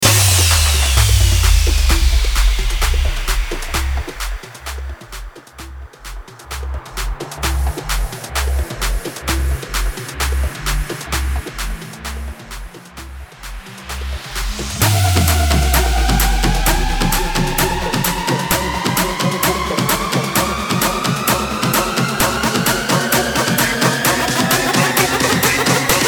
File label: Tribal, 130BPM